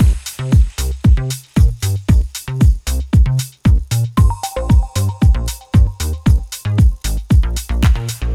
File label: Groovin; BB 2HTC Samples Vol 4